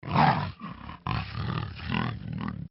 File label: wolverine